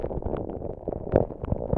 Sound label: Bouill VerySlow Move; Puddle Plus